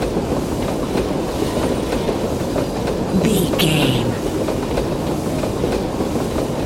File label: train tracks loop